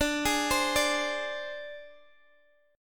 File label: G sharp Major Flat 5th D, chord